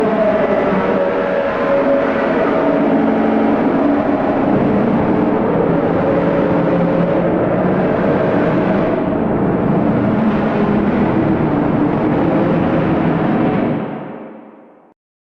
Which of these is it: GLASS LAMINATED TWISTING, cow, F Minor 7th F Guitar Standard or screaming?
screaming